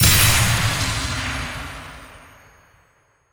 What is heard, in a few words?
big Win Text Change super; netent